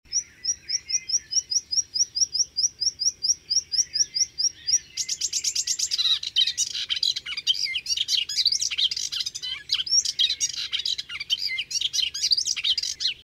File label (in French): Fauvette jaune